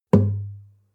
nylon guitar drum single tonal bass

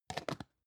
plastic can small lid close medium

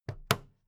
plastic clasp close regular

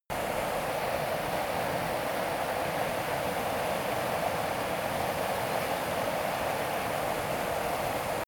river stream distant birds loop

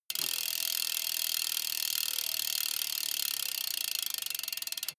bmx bike freewheel push and slow down short

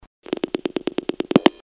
phone dial

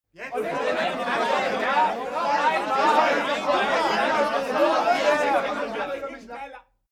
male crowd grumpy short

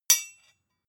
sword hit clack soft

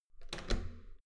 metal door heavy handle down

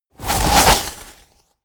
whoosh flutter

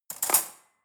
cutlery fork drawer handle grab

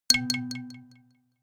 notification kalimba delay low

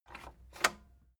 cassette eject click slow

